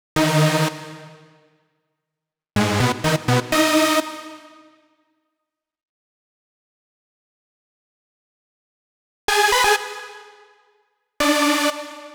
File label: 125 G#m Synths, Complex Electro Synths; Prime Loops; Transport